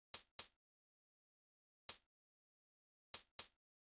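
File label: Hat Closed; VariBreaks; hydrogen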